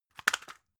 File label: drug package drop hard; arzt